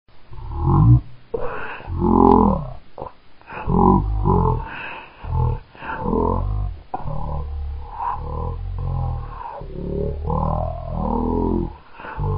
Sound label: train hole; vapesquared